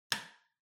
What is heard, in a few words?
Light Switch Box Rocker Hall Mid